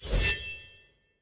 Skill Knight

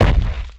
Footstep Giant Beast; Mars Sortie

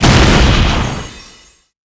Frozen Blade Target

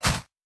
Hitting Short

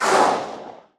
Finalboss Vox Damage

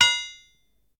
Drinking Fountain

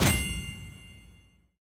Side quest open

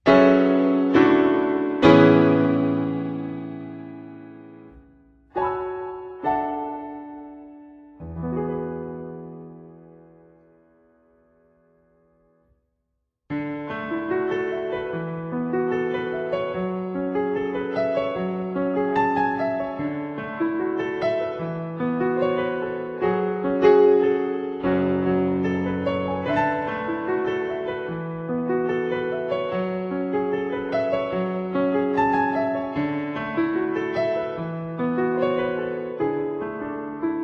Emotional Piano Music; gamerip; menherafflesia flowering abyss; android ios mobile switch windows

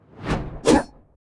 Goblin Attack

